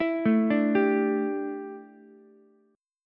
D Suspended 2nd Suspended 4th D down Ukulele; arpeggiated

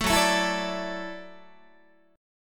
F sharp Minor 7th Sharp 5th, F sharp, Guitar; strummed; 4A,4E,5A,5D,5G#,6C#

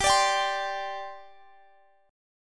D 7th Suspended 4th G

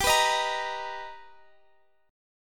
B Augmented Major 7th G, chord

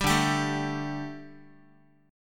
D-Suspended 4th Guitar, Dsus4 chord; strummed

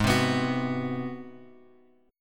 G sharp 7th Sharp 9th; G#7#9 Chord {4 3 X 4 X 2} strummed

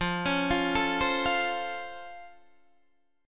C sharp Augmented Major 7th F

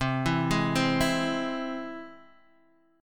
C Augmented down Guitar Half Step Down

